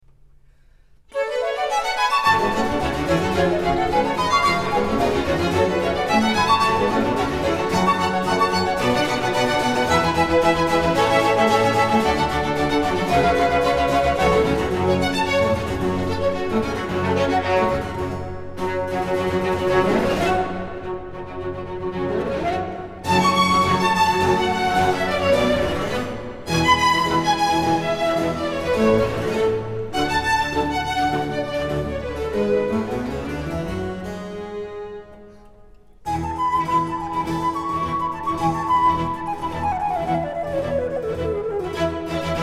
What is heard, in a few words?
Concerto For Flute And Strings In G Minor; Antonio Vivaldi; Op.10, No.2, R.439 ''La Notte''; 2. Fantasmi